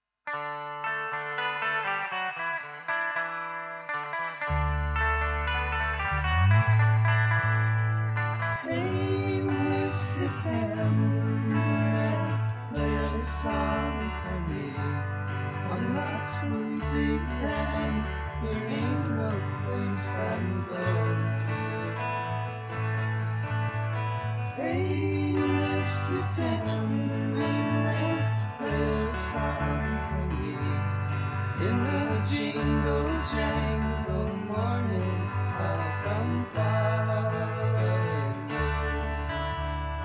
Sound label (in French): Sample Tamb, Réverbe 6PG5